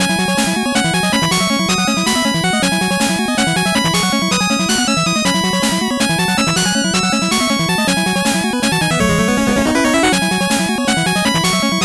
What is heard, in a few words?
8bit bgm slow